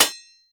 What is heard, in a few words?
Ricochet; blastmorph pinball; xbox series xs